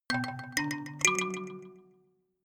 notification kalimba delay triad up minor low

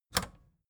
record player power click on fast

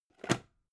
plastic bowl small close rattle

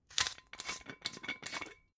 glass bottle massive open scratch

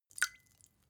glass drop

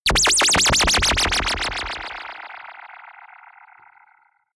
general ufo twistings

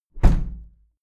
window plastic squeak close heavy

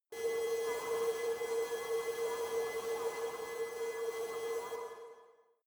space alien fabric machine close high

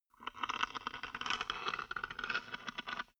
wood board scratch

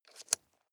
nylon leather click

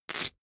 poker chip stack big rattle short

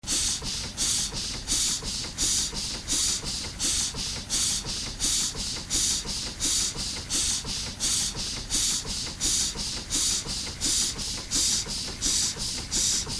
steam engine valve

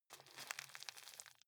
juggle squeeze

